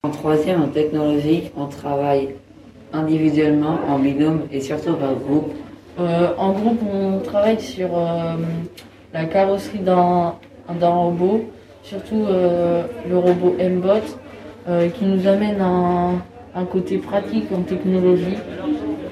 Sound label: techno